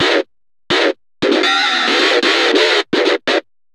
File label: GTR, Chemical Beats